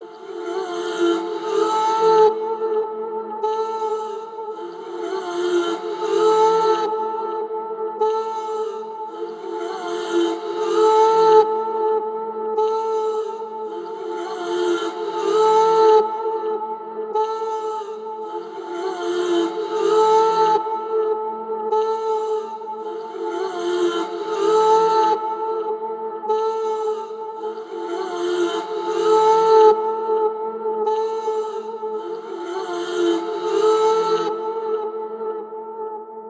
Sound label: Vocal Chop Serenity - 105 BPM A Min; Vocals; Cymatics; ZODIAC Beta Pack